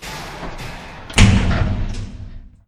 door lock; Apotheorasis

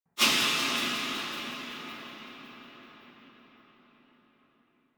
Impact Metallic Thin Dissonant Impact - Echo; Hollywood Edge; sound designer's toolkit v2

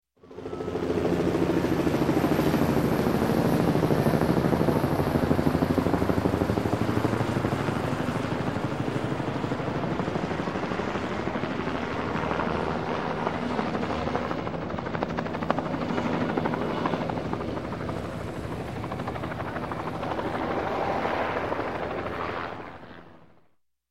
Helicopter Army Pass By; Sound Ideas 2000 Series

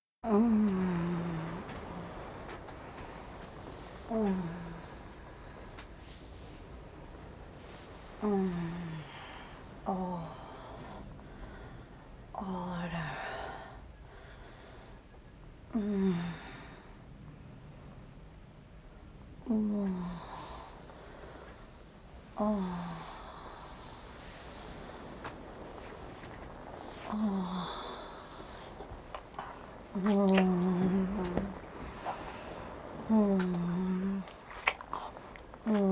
Vocal effects breathing Moaning Baby

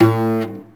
Cello Hit String Snap; SFX Kit; Foley - Misc

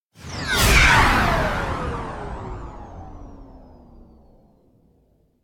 Space Ship By - Slow Stopping; Hollywood Edge; sound designer's toolkit v2

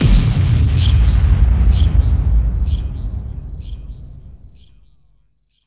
POW; XXL 800 Production Effects

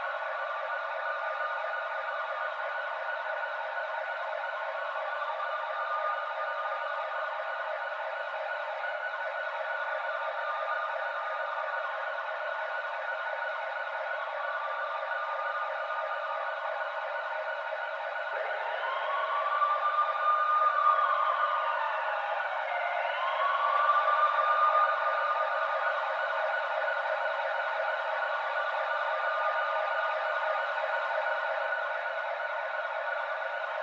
police sirens far loop; Blind Drive

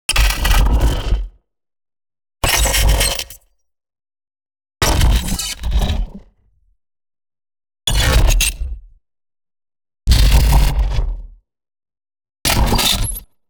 NEON ZAP; Electro Stomp; boom library

